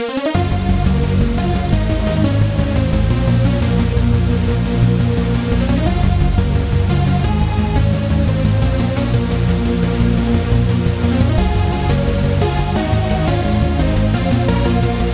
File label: Chord; Routed Sound; Sylenth1 Expansion - Hyper Gamer Vol.1